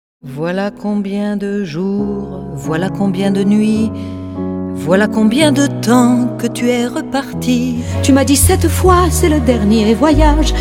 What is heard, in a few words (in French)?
Chorale